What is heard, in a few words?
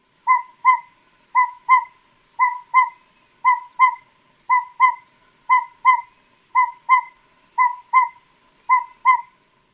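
Northern Pygmy-Owl, paired toots; AZ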